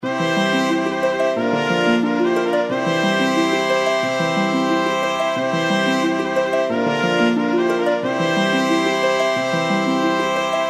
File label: outro bgm; Royal Demeanor